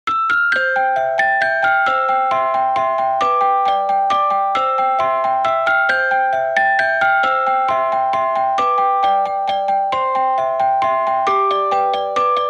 Ice See a Lassie

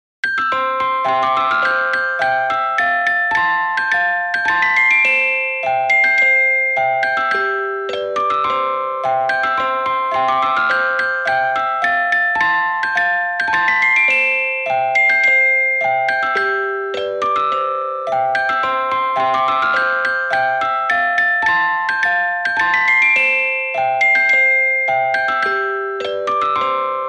Dixie, Ice Cream Truck

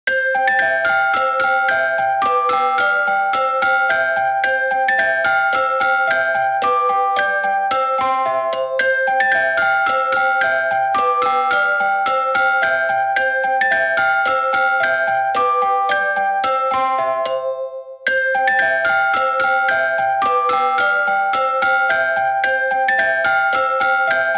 Ice Cream Truck, London Bridge

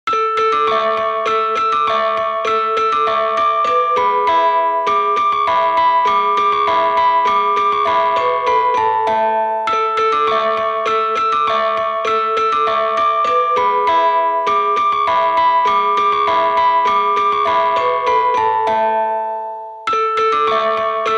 Marionette, Ice Cream Truck

